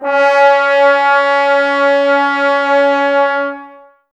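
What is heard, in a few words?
Brass trombones C#; Miroslav Vitous - Woodwind Brass Ensemble; Tbs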